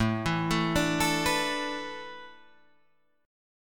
A Suspended 2nd Suspended 4th down Guitar Drop D

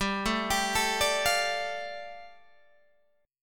G 7th Suspended 2nd, Guitar Open G

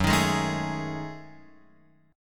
F sharp Minor 6th Add 9th, Guitar, Open F; strummed; JGuitar

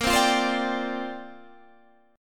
C 7th Suspended 2nd Suspended 4th Bb

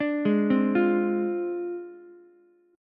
D Minor 7th Flat 5th Ukulele; Dm7b5; arpeggiated

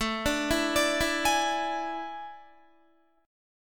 A Major 7th Suspended 4th, Guitar; arpeggiated; JGuitar

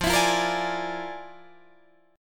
F Minor Major 13th, Guitar, DADGAD

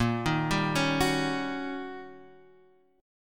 Eb 7th Sharp 9th, Bb

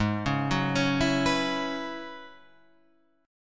Gb 7th Suspended 2nd Suspended 4th Ab

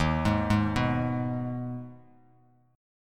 D sharp Suspended 4th Sharp 5th, Bass Standard; arpeggiated; JGuitar

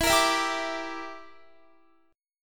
E Minor 7th Flat 5th E, Guitar; Em7b5; strummed

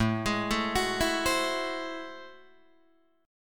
A Minor Major 13th, down, Guitar, DADGAD; AmM13; arpeggiated

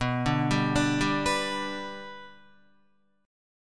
B Minor Sharp 5th, Guitar; Bm#5 arpeggiated; JGuitar

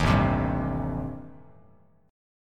A-Minor 7th Flat 5th, Bass Standard, chord; 11,0,10,0; Am7b5 strummed; JGuitar

